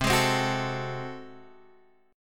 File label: G sharp 7th Flat 9th C, chord